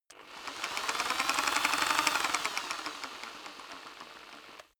rock drill hammer rev very long soft; maschinen; bohrer; soundpool

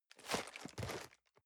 cardboard box medium turn; objekte

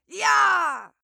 female cheer

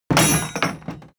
wood impact metal rattle hard

